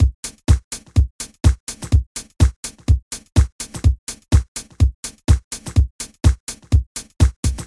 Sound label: House Loop